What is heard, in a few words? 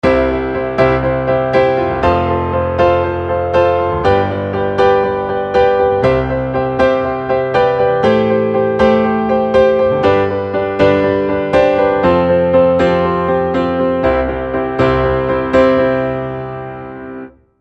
Grand A